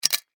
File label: Light Pull Chain, Foley, Light, Switch, Pull, Chain; Lights (Sound Effects:Household); BlastWave